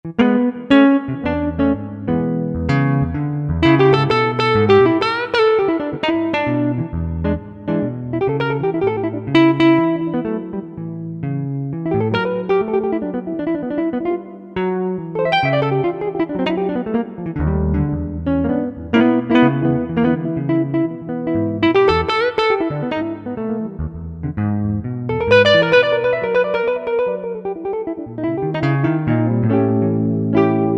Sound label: Blues Guitar